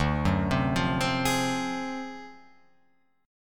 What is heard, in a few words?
D 7th Sharp 9th Flat 5th, Guitar Open F; arpeggiated; JGuitar